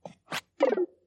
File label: apologetic emote; animal crossing